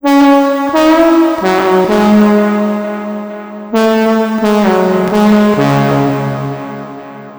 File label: Majestic Horn; fabrik pro